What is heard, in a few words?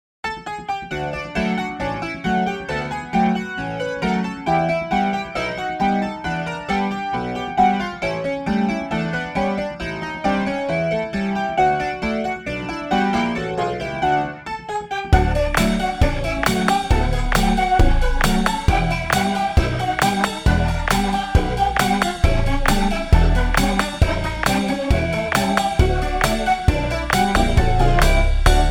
Rotary Fun